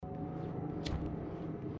feuerzeug; Little Nightmares; gamesoundopedia

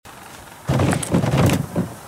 durchsuchen kiste; The Flame in the Flood

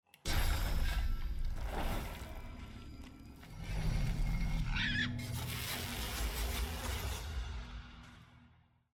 Nekromantie; divinity original sin ii

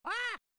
spieler steht vor abgrund; jazz jackrabbit 2

